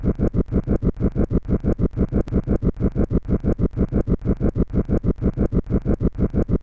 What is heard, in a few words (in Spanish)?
Muchos pasos; RPG Maker; Legend of Heroen v1.20